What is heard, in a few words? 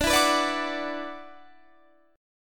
E 7th Suspended 2nd, D, chord